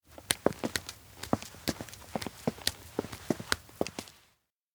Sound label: steps outdoor town walk; rikka; tsumire; anemoi